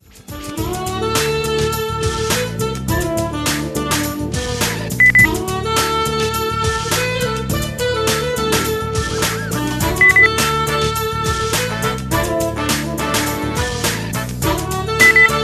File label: jazz saxophone symphony